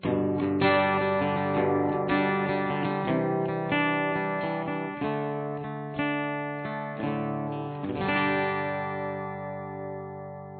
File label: ending